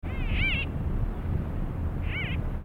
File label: merops bullockoides, birds calls; meropidae